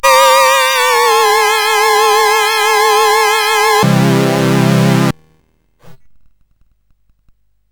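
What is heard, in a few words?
Quadrant 4, MOS 8580 SID chip music, games; CSG8580R5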